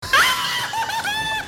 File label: ahuhuhu